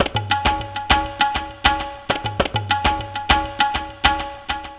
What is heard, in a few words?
Tabla Element; Electronica